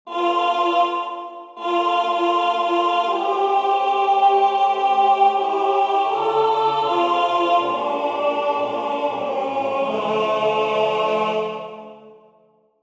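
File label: alto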